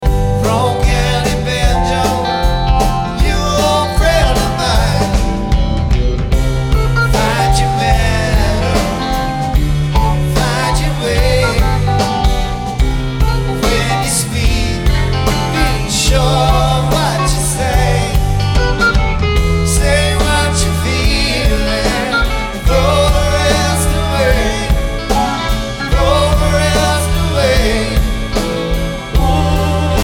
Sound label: Banjo